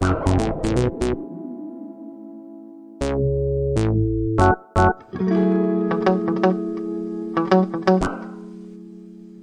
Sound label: 102 funky hip hop bass and chords; SAMPLED MUSIC LOOPS